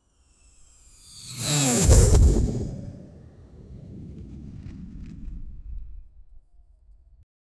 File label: fireball flies by